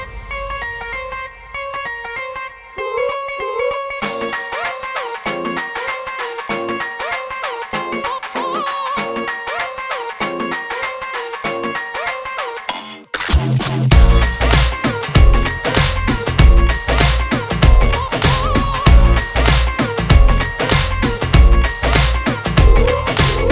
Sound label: bangra dance